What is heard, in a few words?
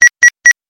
subtle beeps